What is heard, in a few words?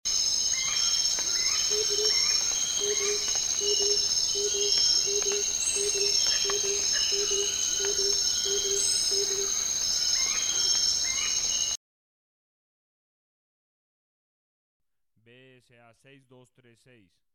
gilded barbet